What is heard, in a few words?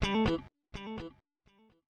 ELEC GUIT HOUSE 125 A, Guitar; eJay